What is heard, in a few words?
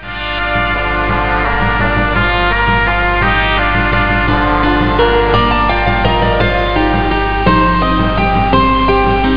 Happy, Music Samples; Symbolic Composer; S-COM Music Samples